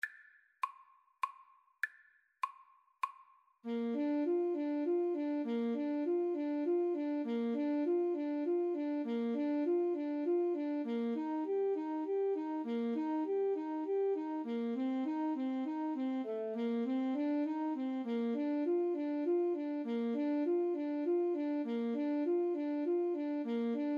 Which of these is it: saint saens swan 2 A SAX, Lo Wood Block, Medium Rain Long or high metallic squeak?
saint saens swan 2 A SAX